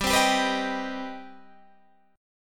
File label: C Minor Major 7th G